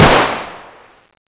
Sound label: usp unsil; cstrike